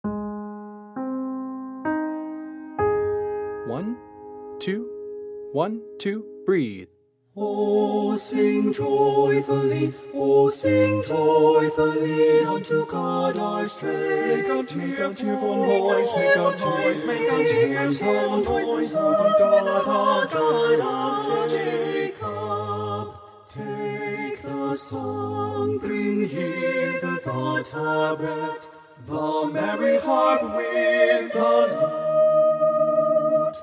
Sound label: o sing joyfully alto mute, choir